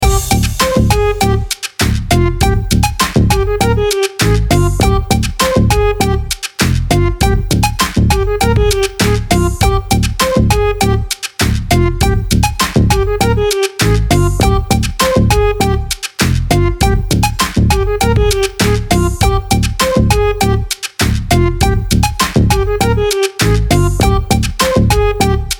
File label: Funk; LatinNeo Soul & hip hop